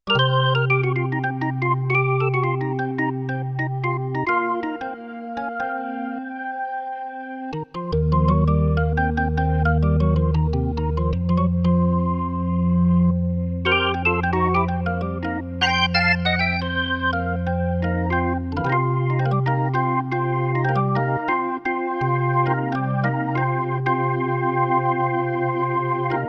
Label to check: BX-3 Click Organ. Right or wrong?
Right